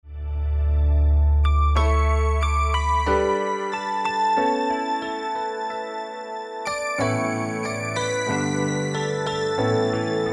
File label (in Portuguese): vozes e acordes